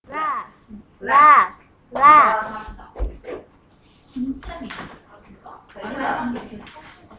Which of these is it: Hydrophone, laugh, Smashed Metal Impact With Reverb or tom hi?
laugh